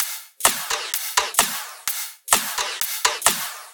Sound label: tops 128; Anthemic.Electro; tops loops